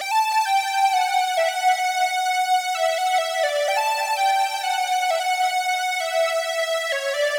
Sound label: 130 BPM B Min Prophet 5 Lead; Synth; Loops; Loop Stems; Cymatics; Fantasy Synth Sample Pack; Lights Out